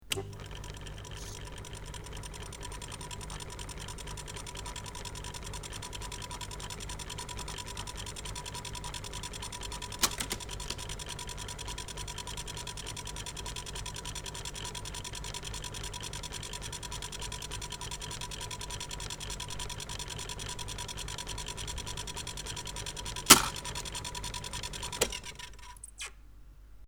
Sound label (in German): Motor, tonband